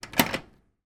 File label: household oven electric switch off